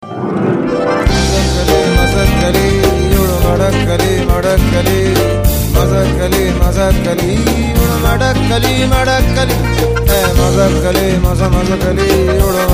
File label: Unplugged